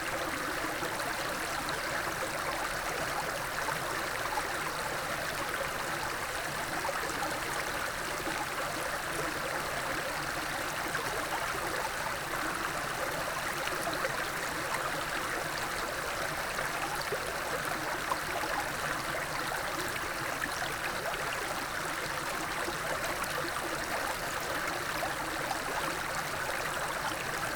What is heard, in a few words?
Gentle Water Stream; WATR Flow